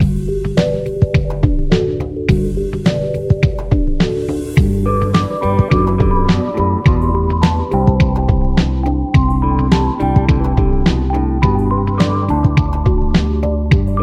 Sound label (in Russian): muzyka dlya instagram